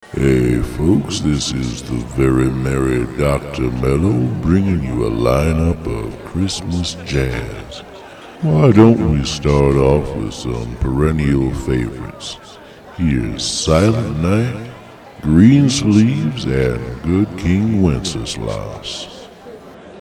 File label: christmas jazz